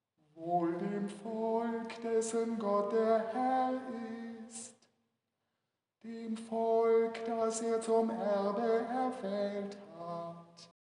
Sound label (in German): psalmton